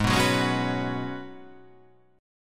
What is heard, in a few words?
G sharp Minor 11th G sharp, chord